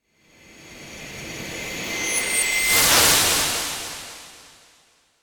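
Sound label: electronic light swallow disappear; anemoi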